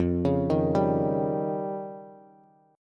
F 7th Suspended 2nd Sharp 5th, Bass, Bass Standard; F7sus2#5 arpeggiated